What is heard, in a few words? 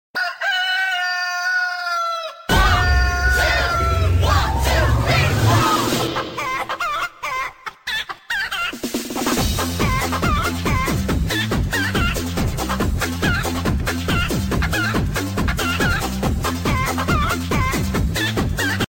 Funny Chicken Ringtone